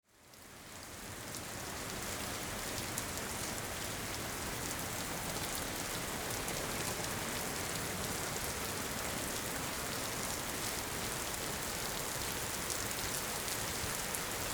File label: rain